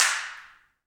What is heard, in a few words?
CLP HNDCL, Handclaps; Roland LCDP14 Africa VOL-2; Afr. Hands